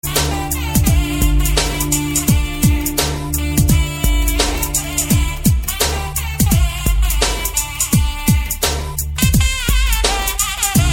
Indian Fusion

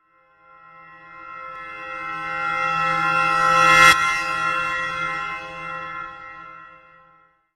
Bell Reverse

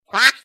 Duck scraming

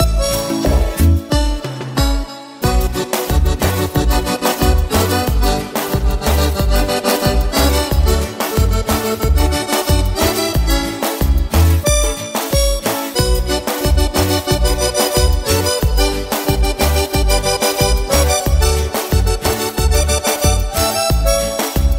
arcodeon

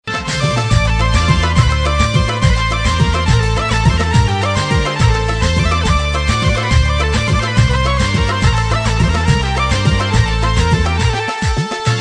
Afghani Music

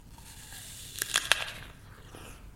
reverb; Apple

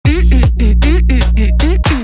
Mmmmmmmm

